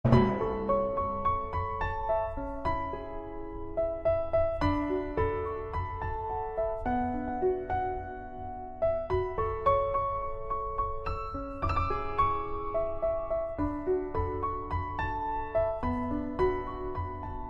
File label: piano ringtone